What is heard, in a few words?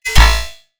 max min operator, bass